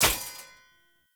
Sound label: Short Sword Throw Medium Impact Metal